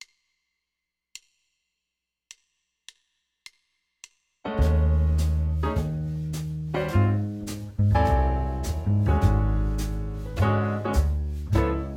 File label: Bar Jazz